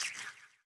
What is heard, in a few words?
Health Bug Collect